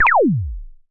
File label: laser; lasers